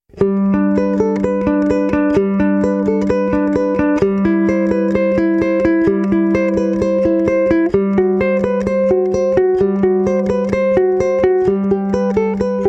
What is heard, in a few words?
Prelude Ukulele Solo